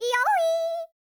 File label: YODEL, World Voices